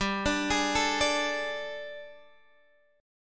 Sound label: G Suspended 2nd Add Flat 9th G, chord; Gsus2addb9; sus2addb9:1,2,(5),b9; x,10,12,13,10,10